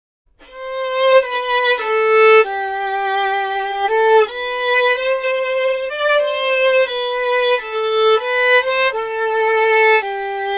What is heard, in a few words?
Bach Chorale; SFPitch resyn